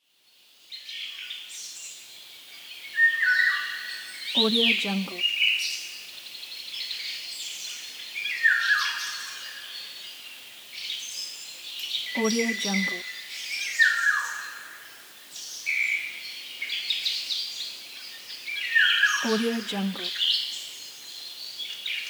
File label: forest